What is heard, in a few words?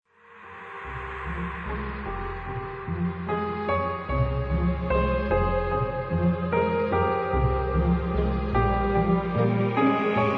ORCHESTRAL